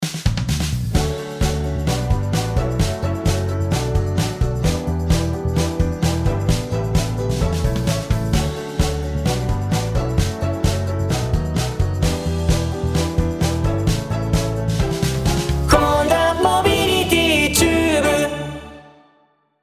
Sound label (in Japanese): ラジオCM20秒BGM